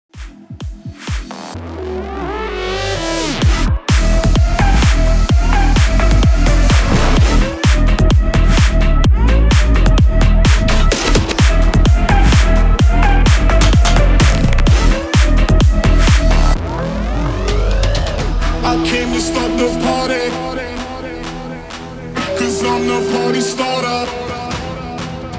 128 BPM

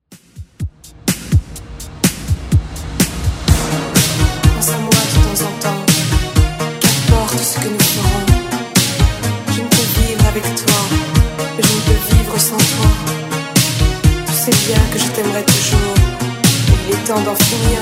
80s, 125 bpm; Redrum

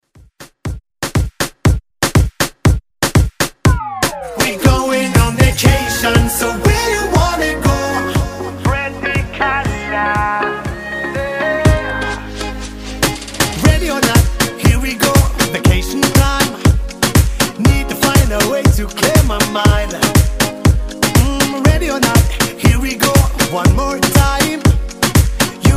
Dance; Redrum

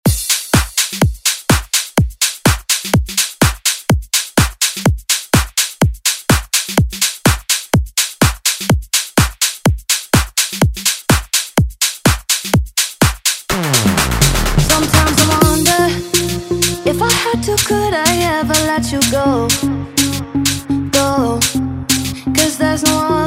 Dance Redrum

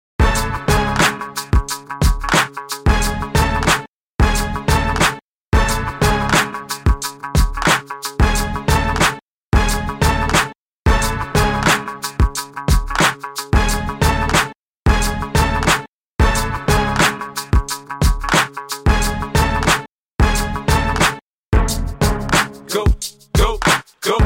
Intro Cuts 90Bpm

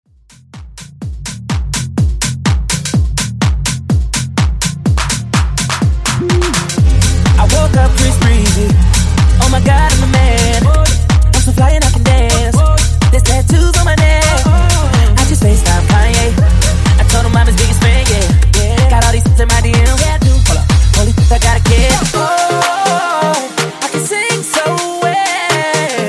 Tech House Mashup

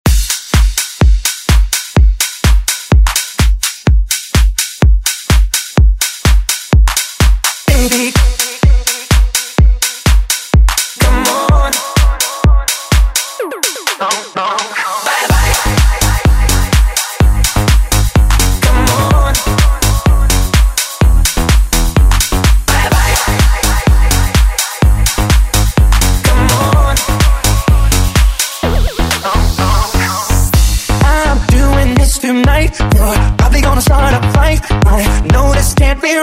Dance; Deejay Hit

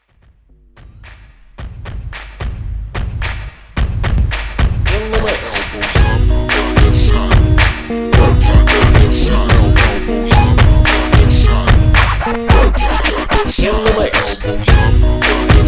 Party Break

